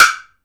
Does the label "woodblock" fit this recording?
Yes